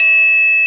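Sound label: triangle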